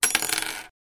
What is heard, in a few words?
drop metal thing; soundbible